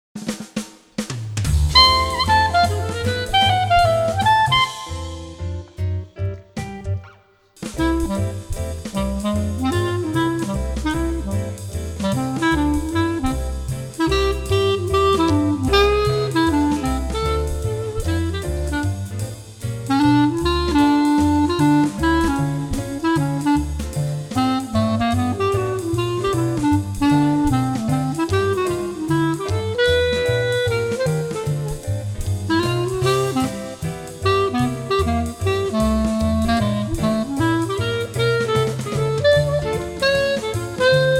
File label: CLARINET; 12 Jazz Etudes Based on Popular Standards-Clarinet Book 1